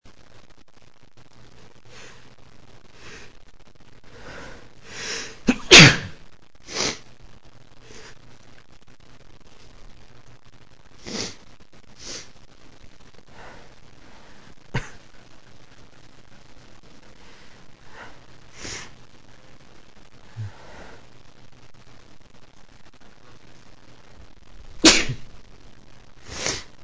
achoo, male

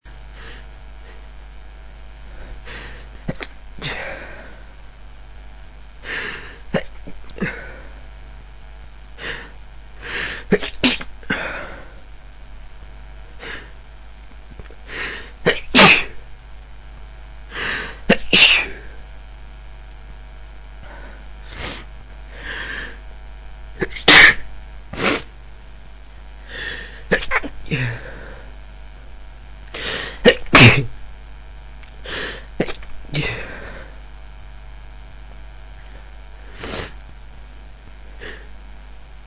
trying to be quiet, male

